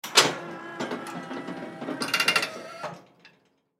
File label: Chrysler Jeep 1972 High Performance 304 V8 Plow Mechanical Movement Clicks; Transportation Automobile; Car